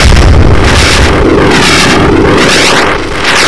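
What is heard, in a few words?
rocket whiz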